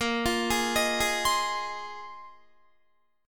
Bb 9th Suspended 4th Bb down Guitar 4F,4A,5C,5G,5C,6E; arpeggiated